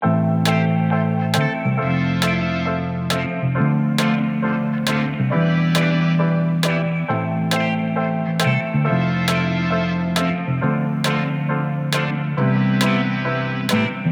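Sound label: Vinyl Piano, A minor, bpm 136, Layered Melodic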